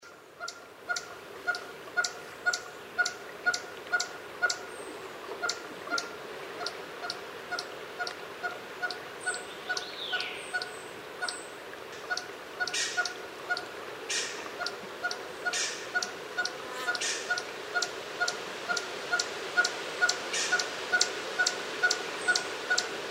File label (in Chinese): 藍腹鷴; 藍鷴; 雉科/留鳥